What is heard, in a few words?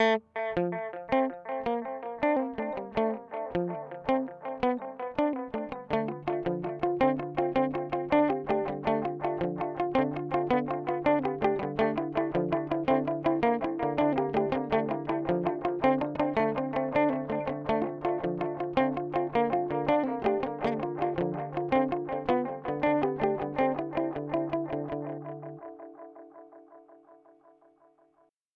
Mooer D7 X2 Analog